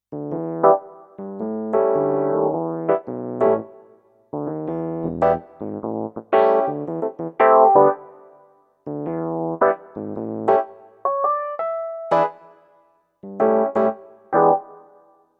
EPiano; Casio CDP-S350

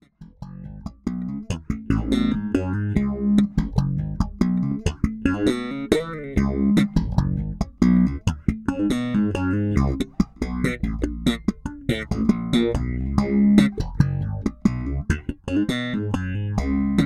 Phaser Slapline

